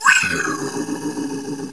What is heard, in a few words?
die